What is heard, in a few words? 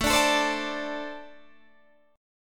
A-Minor Double Flat 5th A Guitar DADGAD